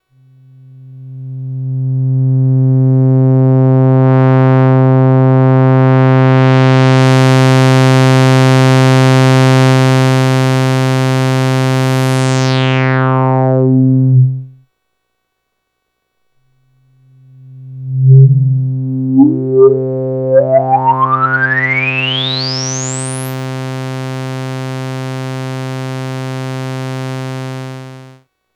LPF

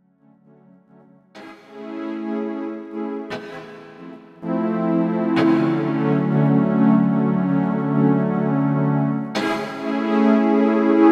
Chords

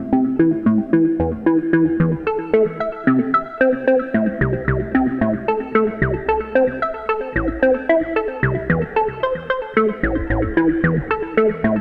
Triangle Processed

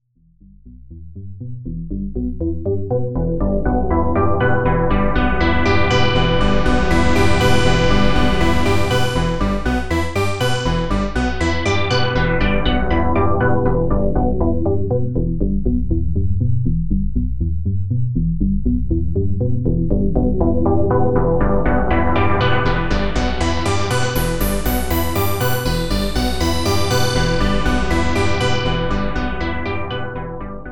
Wavetable Seq; Ableton 10

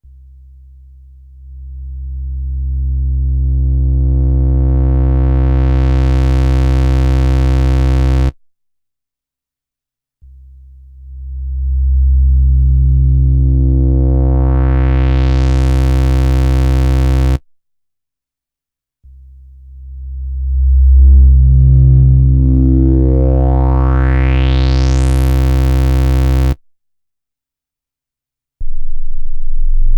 Behringer Neutron Synthesizer Filtered Square

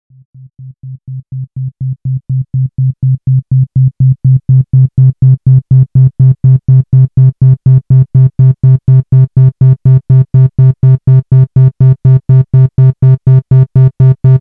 Oscillator

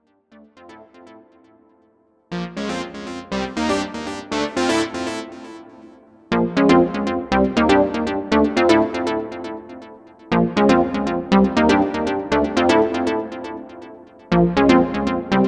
Novation Peak

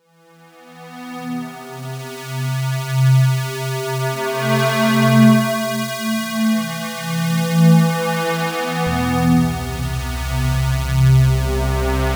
Wavetable Pad; Ableton 10

